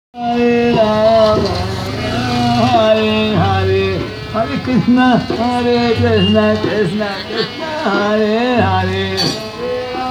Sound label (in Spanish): Canto musicos hare krishna; MATHURA